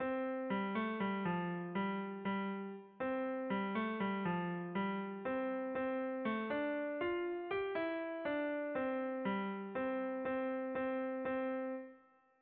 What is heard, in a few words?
melody